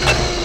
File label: Tank Turret Failure; 1944 Battle Of The Bulge